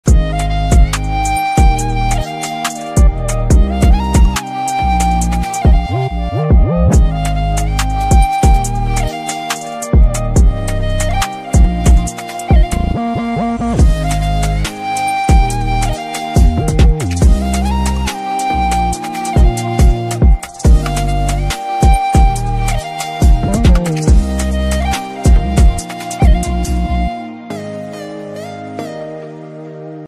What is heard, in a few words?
nepali ringtone nepali flute music ringtone melody